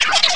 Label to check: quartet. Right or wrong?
Wrong